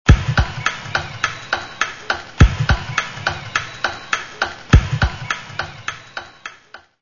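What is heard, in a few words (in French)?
temps tic tac a prendre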